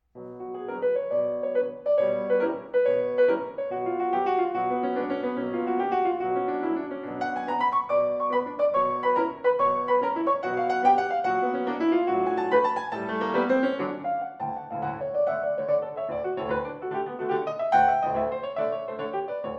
The Nutcracker Suite, Op. 71a (Arr. For Piano) III. Tarantella